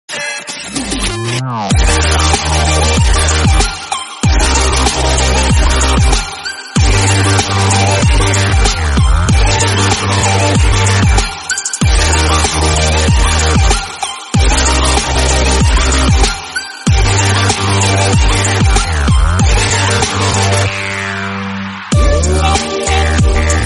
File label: instrumental song